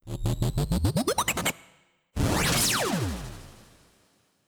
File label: laser cannon charged shot